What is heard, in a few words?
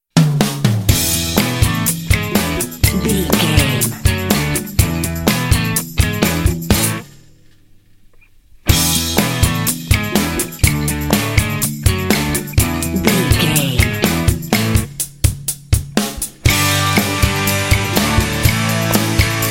indie pop jams